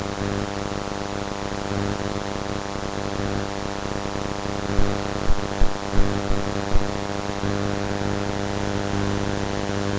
bruit fond

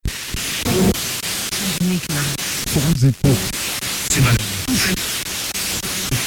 séquence pet ralenti

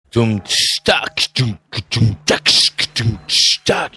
batterie

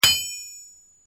coup pioche

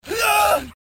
cri barbare

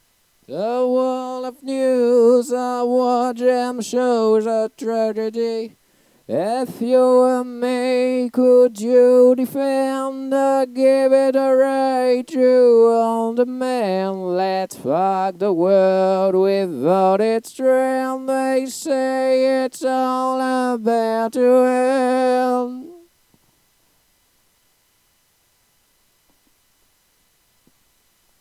refrain chant

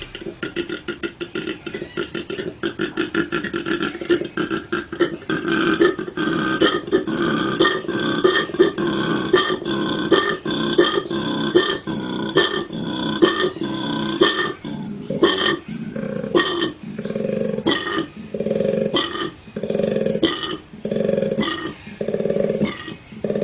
animal en rut

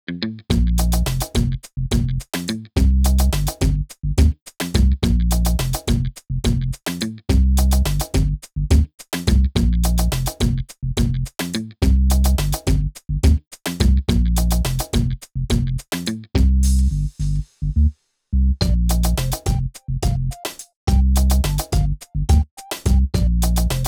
musique d'ambiance podcast

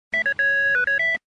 SONNERIE; totally spies

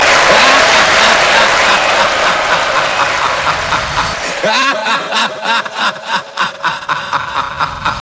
joueur éliminé; triller

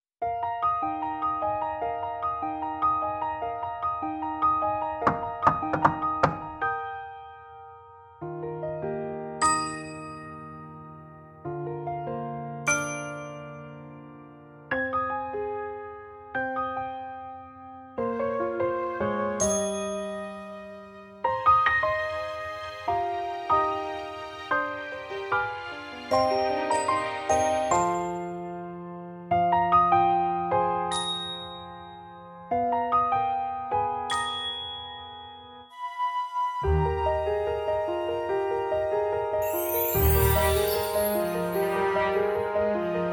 instrumental

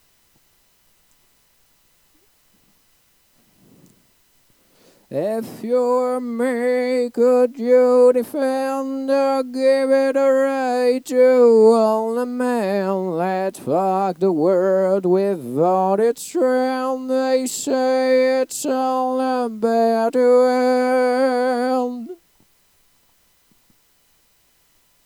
refrain chant

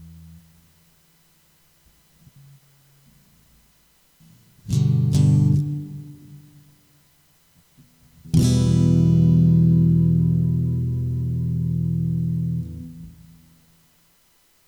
fin guitare accord ronde

